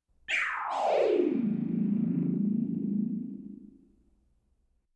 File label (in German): zwei kosmische entladungen; Science Fiction